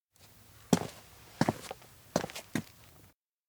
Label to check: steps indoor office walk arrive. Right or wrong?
Right